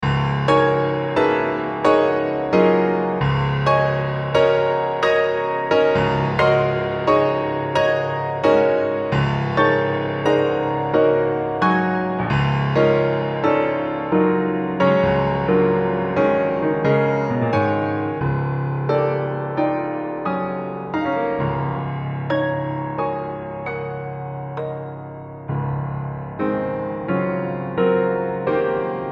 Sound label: majestic bells